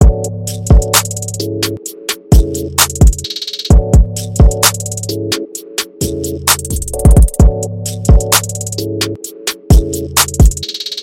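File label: california instrumental ringtone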